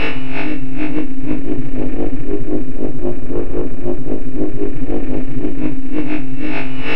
ambience

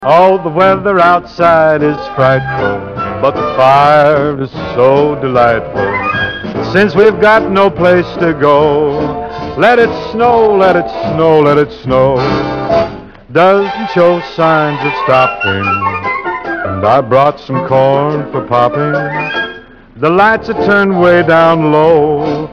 music loading

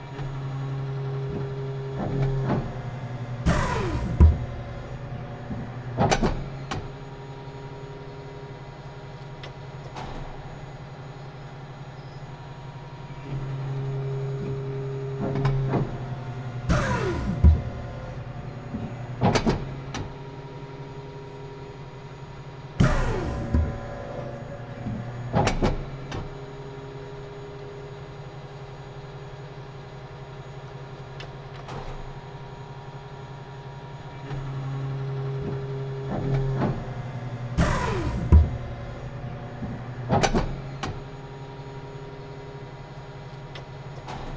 ambience industrial

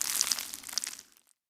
small debris

